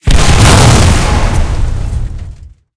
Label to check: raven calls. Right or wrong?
Wrong